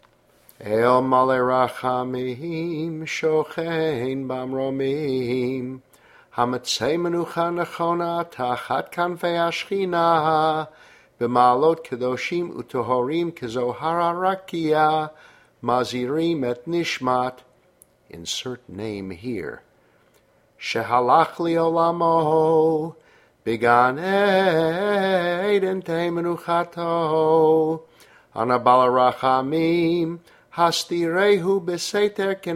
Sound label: Eil Malei Rachamim Male